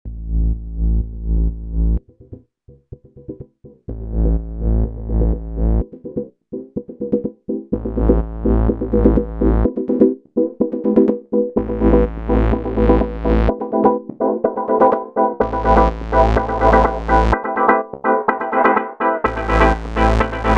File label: techno live sets synths drums live performance